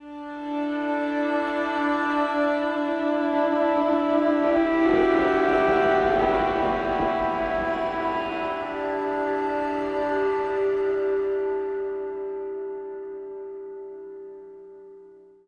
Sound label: Acoustic Drone; Sonic Foundry (Sony Creative Software) - Friendly Fire; DroneLoop